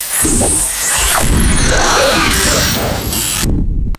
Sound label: synthesized electrical zap and shattering crash